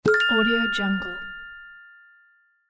the correct answer; medusamusiclab

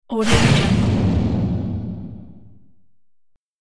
magic explosion spell

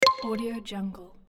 plucky mallet video game menu select sound

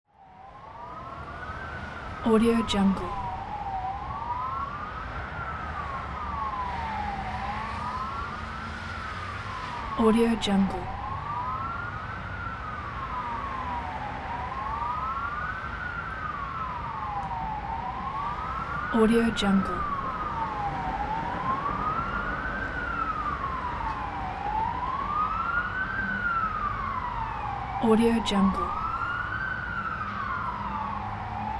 ambulance siren in city traffic

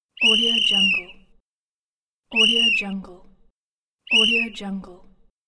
referees whistle

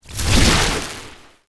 defense spell lightning cast magic; crackling zap

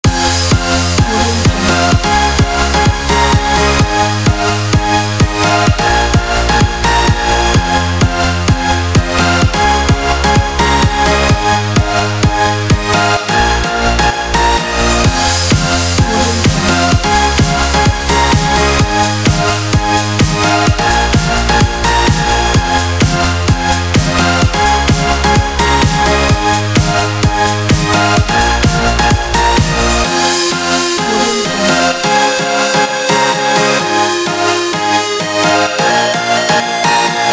epic progressive house

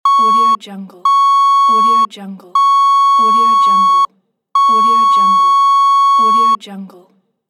censor beep; rawaudiolab

